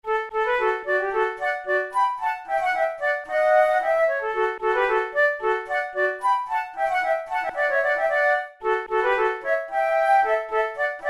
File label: duet, flute